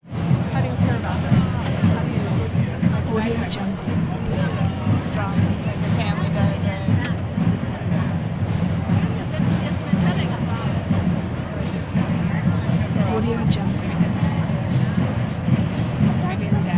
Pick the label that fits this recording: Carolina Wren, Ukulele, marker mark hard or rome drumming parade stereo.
rome drumming parade stereo